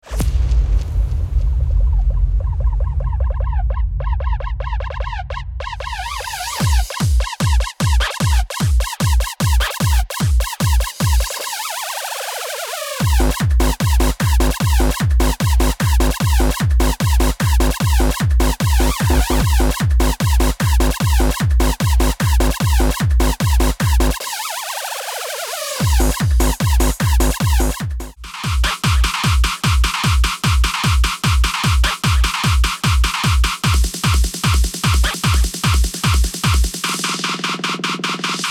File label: Groove bass